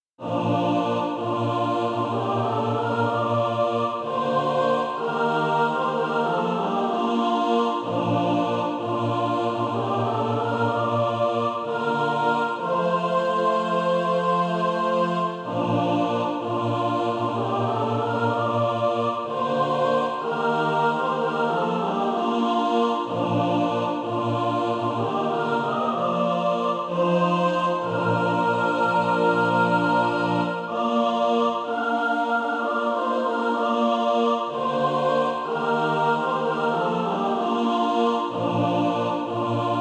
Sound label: mixed